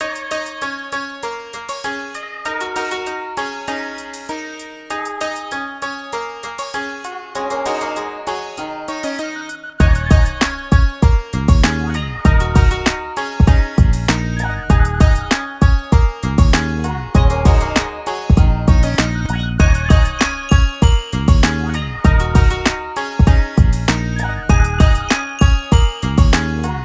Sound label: 098 Emin, Hip Hop Exotica, Apple Loops; Big Fish Audio